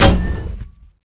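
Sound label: gunner gun attack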